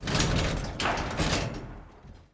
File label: explosion metal armor debris; Explosions